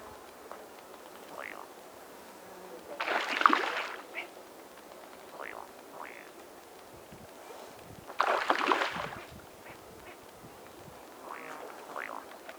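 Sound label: andean duck oxyura ferruginea; utok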